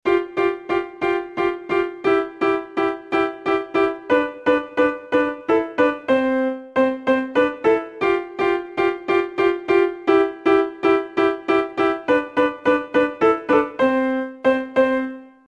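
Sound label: chopsticks, piano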